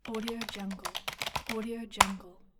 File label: typing in password quickly